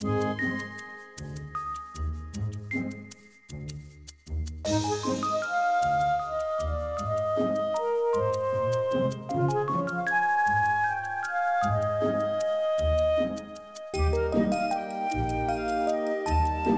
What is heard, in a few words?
Musicloop; Pokémon Masters Arena